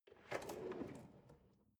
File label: drawer large draw